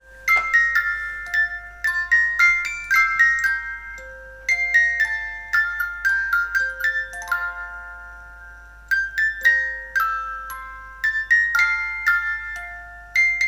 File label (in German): Spieluhr; Guter Mond